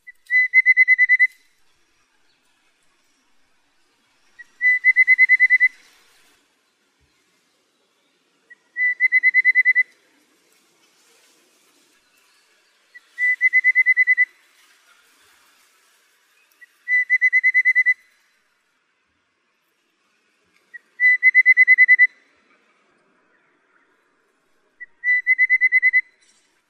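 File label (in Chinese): 山紅頭, Yushan National Park; 紅頭穗眉; 畫眉科/留鳥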